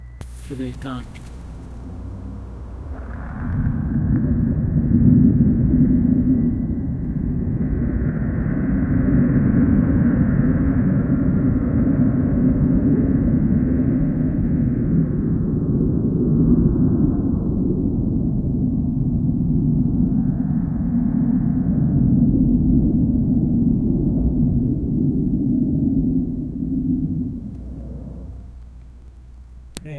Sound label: erupting volcano rumbles